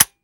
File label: weapon fire first; gmod; viper